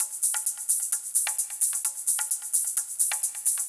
Live Percussion, 130 BPM

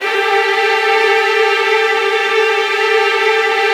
VN TRILLS, Virtuoso Strings; ILIO; Kirk Hunter Virtuoso Strings VOL-1

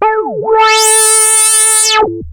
OSCAR A4, synthesizer; Hollywood Edge - Giorgio Moroder Rare Synthesizer Collection

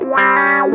GUITAR

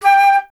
flute G; Miroslav Vitous - Solo Instruments VOL-2; Solo lnst. II

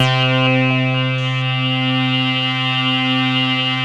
CS-15, BASSES

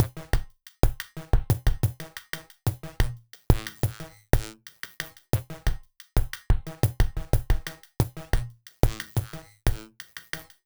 Elektro, Processed Percussion

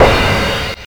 crash, trash kit, Drums Dance & Groove; USB Soundscan vol.11; AKAI

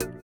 VIBRA; Spectrasonics; Metamorphosis

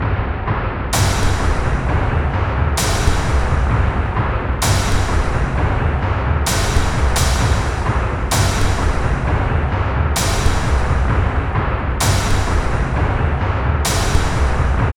LOOP, Tekno Industrial; East West

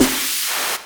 SD HOU, Dance Mega Drums; Best Service

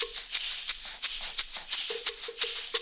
Roots Reggae; Sounds Good - Roots Reggae

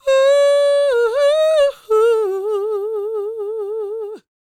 CROON, Vocal Planet Blues & Soul; CW SOUL

